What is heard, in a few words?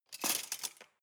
cutlery large multiple drawer grab